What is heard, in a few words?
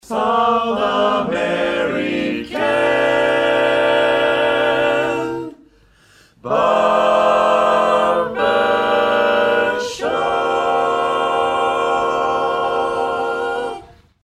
Southamerican Barbershop All Parts